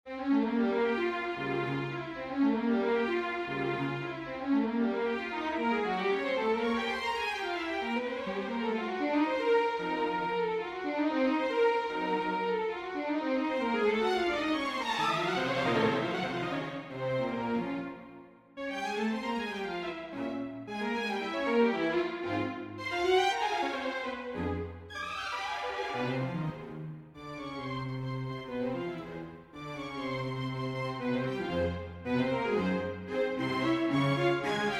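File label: prélude, string quartet; chopin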